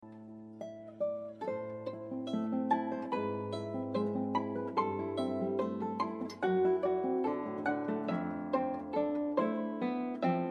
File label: duo violin guitar